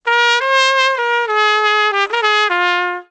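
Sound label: cornet toot; Musical Instruments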